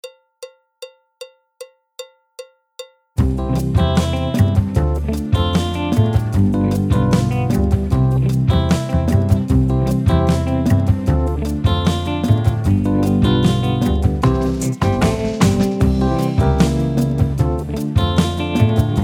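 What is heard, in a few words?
Calypso backing track, Jazz; clarinet, flute, trumpet; Jazz Syllabus Level 2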